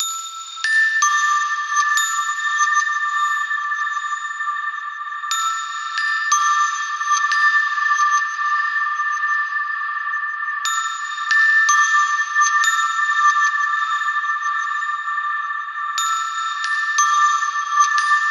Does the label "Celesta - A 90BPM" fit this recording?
Yes